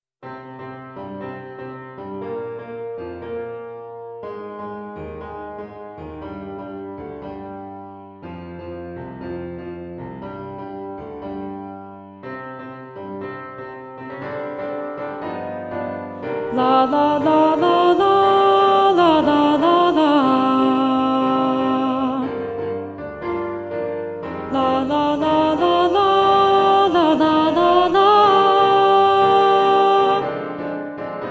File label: Soprano 1; Practice Room